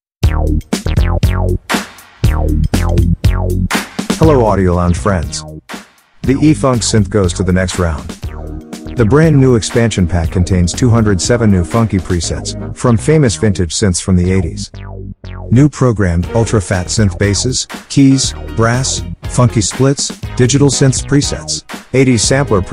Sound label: e funk synth